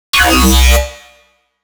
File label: fluctuate, bass